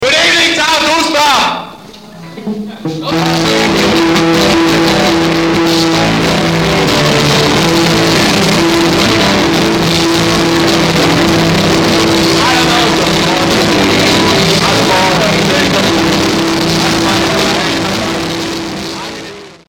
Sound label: drinking; Drinking all night; raw version